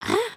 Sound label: camera picture; emotes